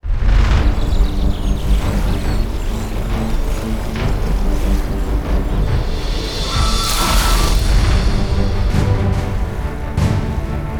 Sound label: pirate adventure